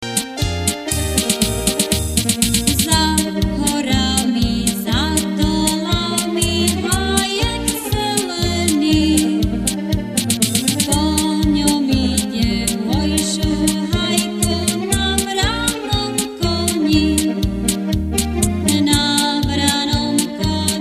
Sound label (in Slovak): akord